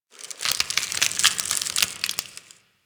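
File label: Ice Crackle Random Ambience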